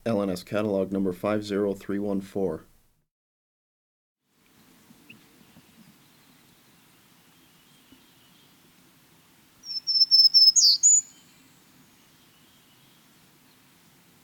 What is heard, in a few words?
Warbler